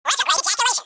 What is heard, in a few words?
retro